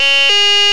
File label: enemy hit